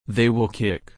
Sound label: kick